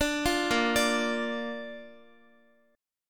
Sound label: Bb Major Bb down Guitar; arpeggiated